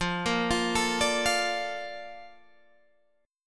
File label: Bb Major 7th, F